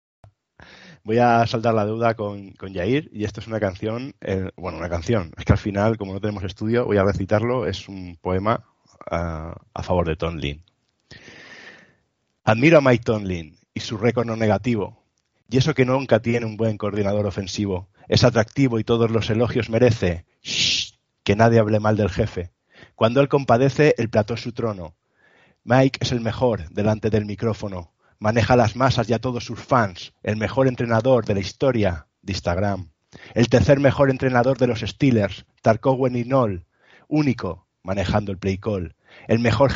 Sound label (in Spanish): acapella verso